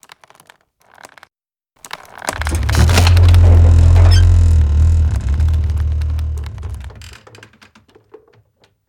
Wind Mill Engage

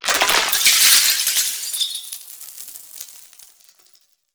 Crystal Dissolve

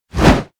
Flapping; Bahro; moul-assets

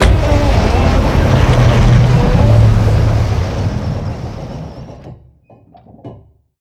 Door Open; moul

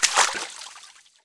Water Run; moul-assets

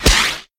Fireworks Launch

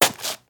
Stone Turn

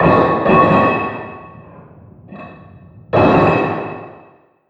Random Metal Drops; moul

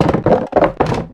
Wood Roll

